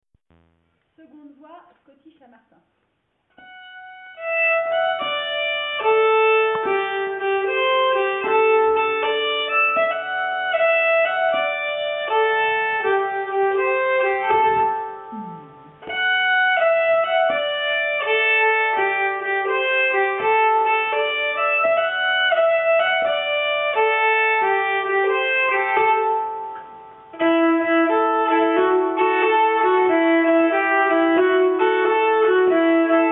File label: Scottish, nyckelharpa; Ernest Scottish